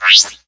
button; buttons

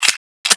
deploy, weapons

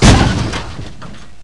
damage, car; q3rally

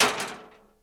full metal bang; q3rally

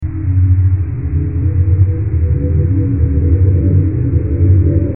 pulsing whine; ns machina

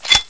panzer insert; weapons; nzp

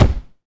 umbra blocked; weapons

